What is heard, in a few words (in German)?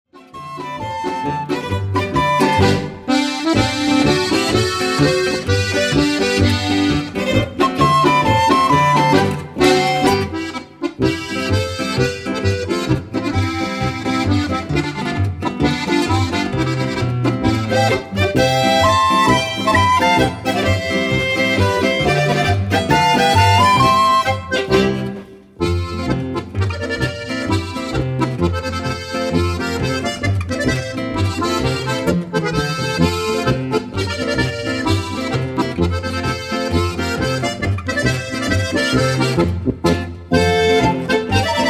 Nagelsepp Polka